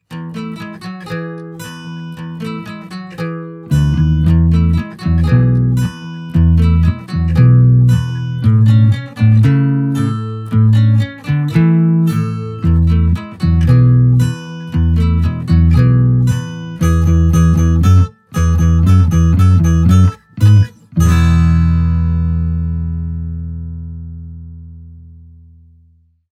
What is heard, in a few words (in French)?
comptine music; souris verte